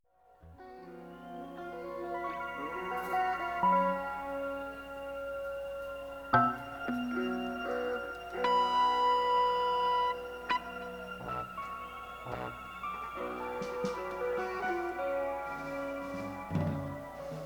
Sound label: Tuning